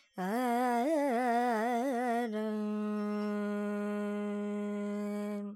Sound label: Dorian, Vocals Carnatic Female Alaps 70 G Dry